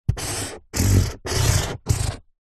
office pen felt tip strokes on empty cardboard box